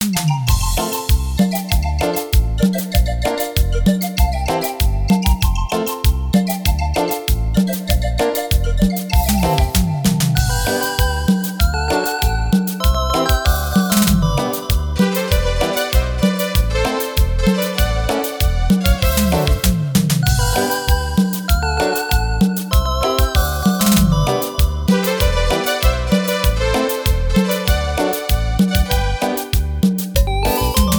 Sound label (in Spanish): Cumbia